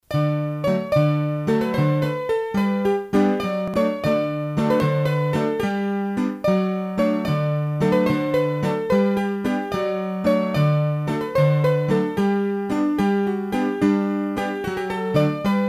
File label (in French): La Bourree a Huit, hurdy gurdy; Bourrees a 3 Temp